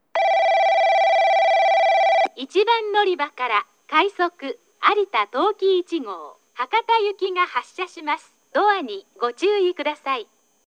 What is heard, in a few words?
tosu rapid arita tokiichi hakata; 鳥栖駅 発車放送（快速 博多行き）